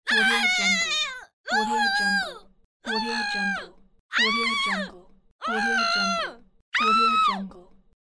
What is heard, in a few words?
female short terror screams pack; medusamusiclab